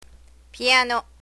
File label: piano